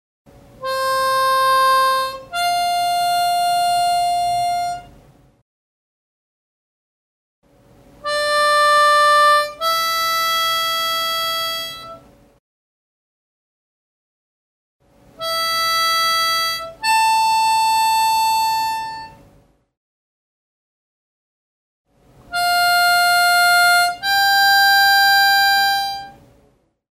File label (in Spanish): notas simples aire opuesto, armonica